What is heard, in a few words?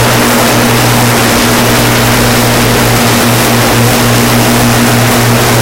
avro lancaster side, engines; War Thunder